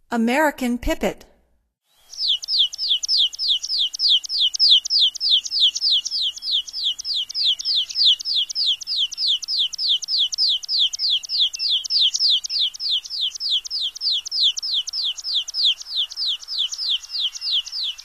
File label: American Pipit, Songs of Birds of North America; Stokes